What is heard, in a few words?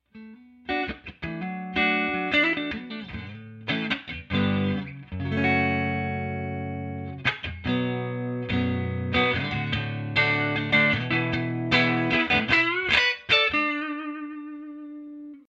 clean